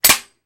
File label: Metal Shell Ejects; Small Hollow Metal Impacts. - Kitchen